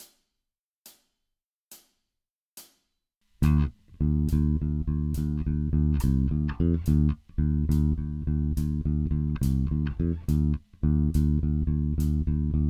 Heavy rock blues, baixo; heavy rock shuffle

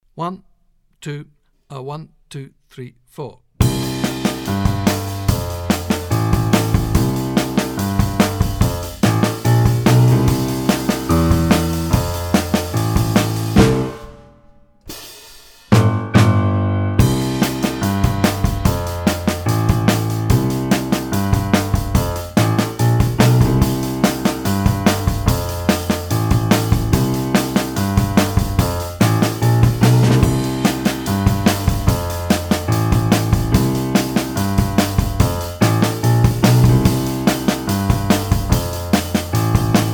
Straight Eight backing track; teclado; jazz iniciante

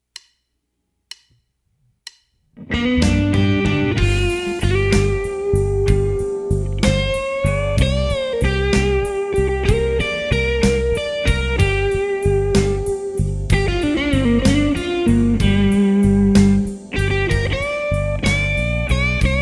All Forms Blues

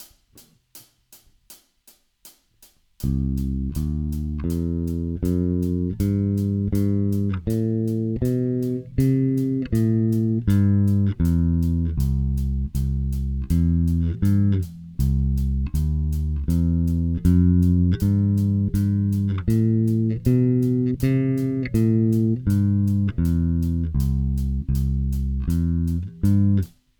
walking bass

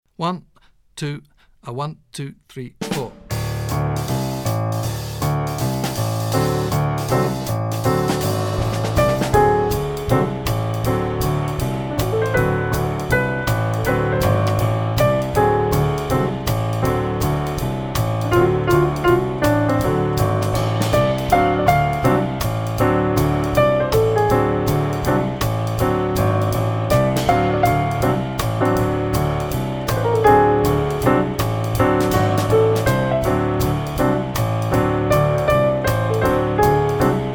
Dominant Dissonance, teclado jazz; jazz iniciante

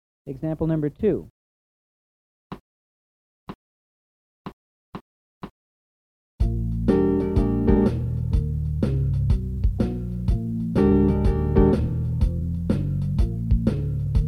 Shuffle Blues In Bb

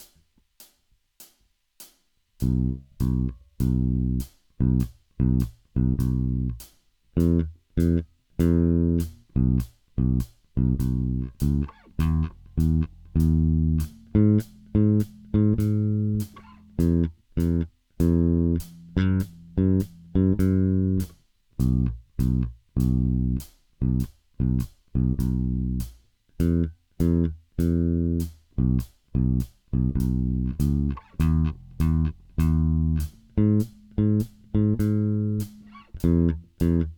motown, baixo; shuffle

